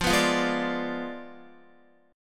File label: F Diminished 7th, Guitar; Fdim7 strummed; JGuitar